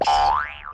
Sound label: boing